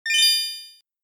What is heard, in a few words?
good event arp